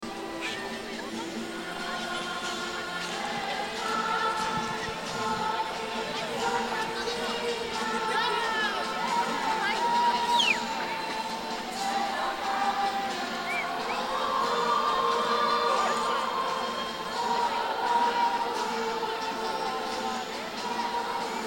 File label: Christmas Concert; Thessaloniki